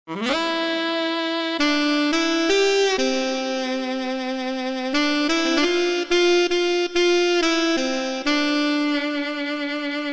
Saxo Kronos

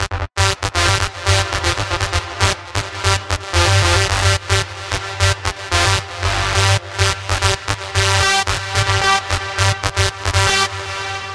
DIRTY SAW

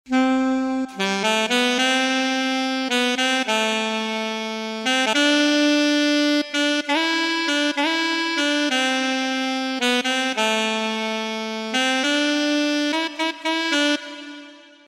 Sax

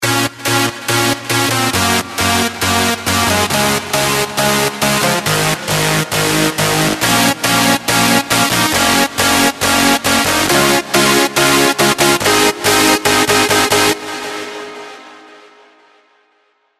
COMP BOUNCE LD

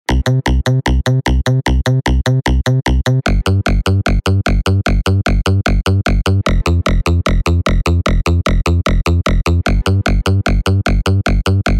Bass EDM

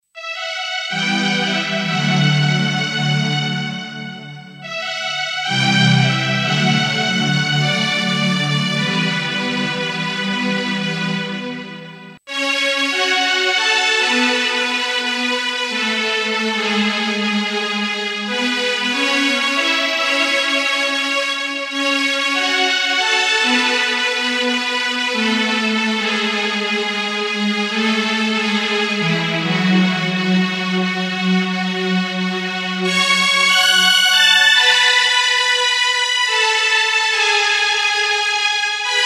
Dance Strings

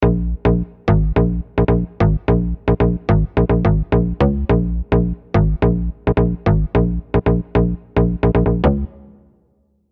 BASSES DEEP BOUNCE

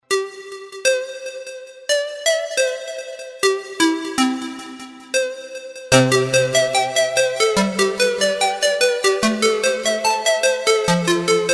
Synth edm

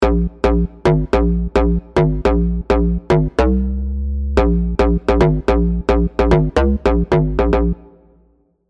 BASSES BEACHBALL BOUNCE

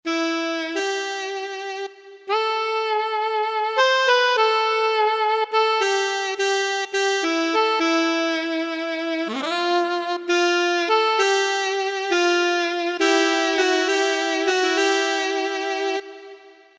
Saxophone

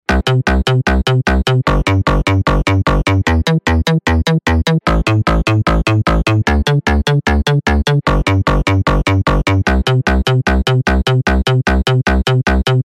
Bass Oldschool

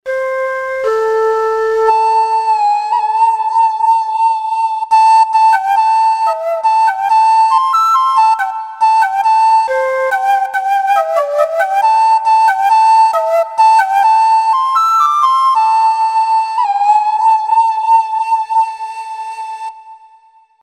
Flute

